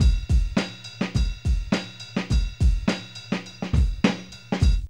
RIDE SWING; Zero-G; Funky Elements

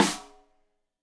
Rim Shot Stereo; Deeply Sampled Drums; SMDrum

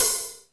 LITE HALF, HIHATS

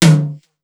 SDS2000 HT, Simmons SDS2000; SDS2000 Factory6

